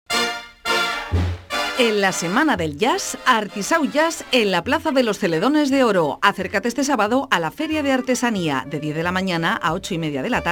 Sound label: JAZZ